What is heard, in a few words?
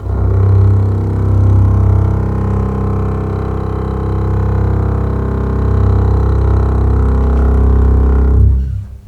LEG MF R, The Ultimate String Collection; East West